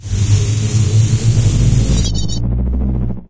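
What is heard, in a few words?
super physics cannon charge up; Source Engine; Portal 2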